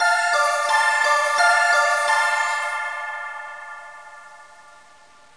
Vase; CD Action

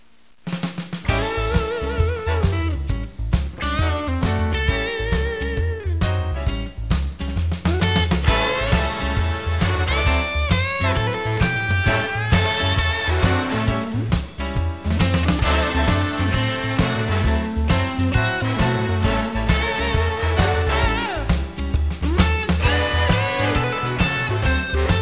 sting; VEGAS Girls

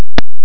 Tymp; Instrmnt; Sound Library 2000; Wayzata Technology

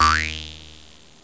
JAW HARP

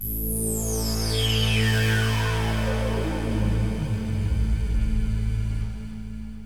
filter sw; Techno Maker SPCS

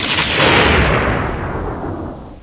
Startup Chime; Apple Wizards - December 1998

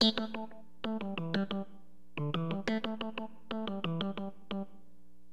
PERCOL, HipHop, Keyboards; LiveAct Pool 1

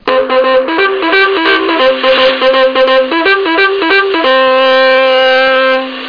ORGAN; Amiga; StixNTrax

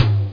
Hi Fl. Tom; Simple MIDI Player

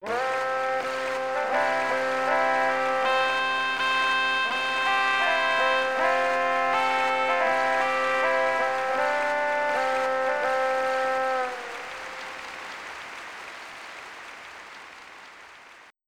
BAGPIPE

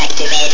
activate; Groovy Bytes: Behind the Moon; VOCALS

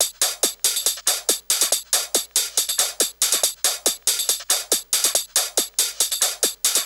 Techno Drums; TechnoMusicMaker

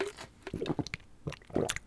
Canteen Drink; Civilization4

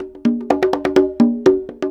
PERCLOOP ETHNO 125; eJay

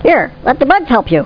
3 Scale Down; How Many Bugs in a Box

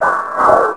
Noise; Revista CD Expert 50

